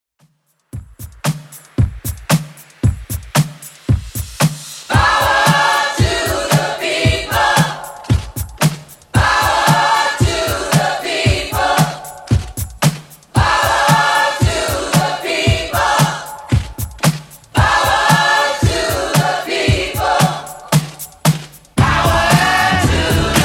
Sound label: 70s Redrum 114bpm